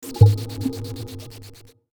痺れる効果音

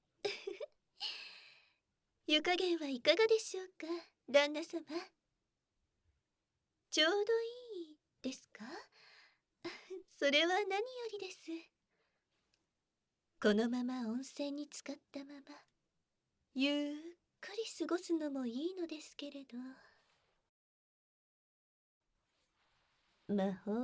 お風呂で泡耳触手責め（囁き重視編集 効果音なし）

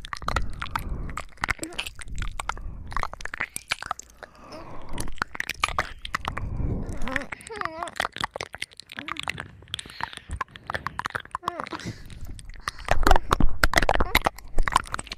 軽く喘ぎ耳舐め, ASMR

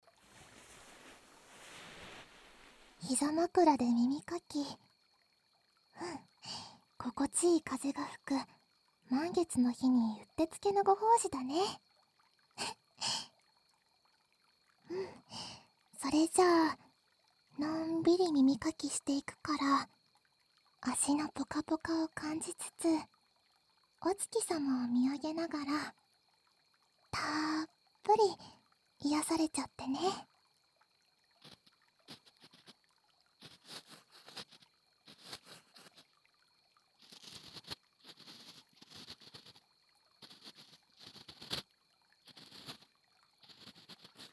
膝枕で耳かき 左右反転

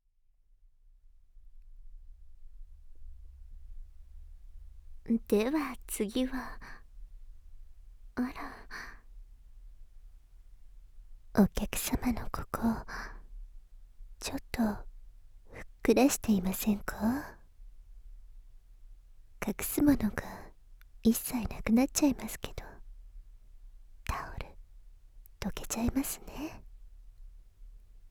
濃密耳舐めで全身とろける快楽施術(耳舐め・ローション乳首責め・授乳手コキ)効果音なし

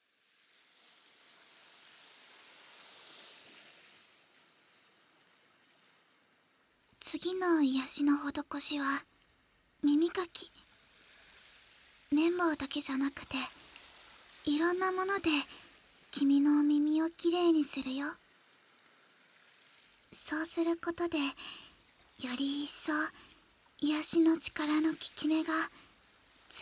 耳かき 右, ASMR

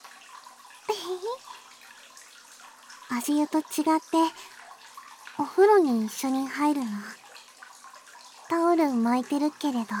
シャンプー（バイノーラルマイク 効果音あり）, ASMR; バイノーラルマイク収録 効果音あり

